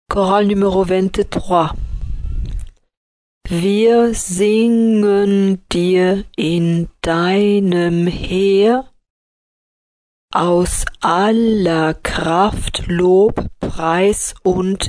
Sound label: choral; bach248; BWV 248